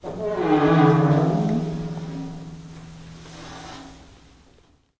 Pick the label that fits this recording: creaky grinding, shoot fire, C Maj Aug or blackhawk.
creaky grinding